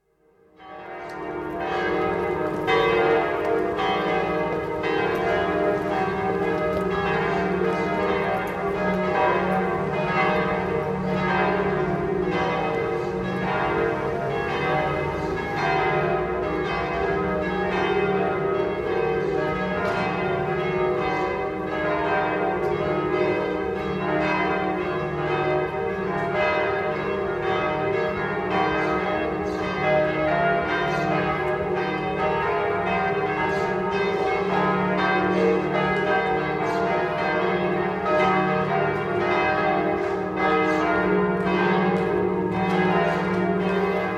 Church bells, atmosphere in church precinct as bells toll for sunday morning mass, with passing footsteps; St Gervais; France; City Sound Effects; BBC